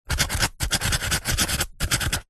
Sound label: office marker pen writing on dense foam or polystyrene; zapsplat